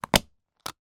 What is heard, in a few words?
office hole punch through multiple pages paper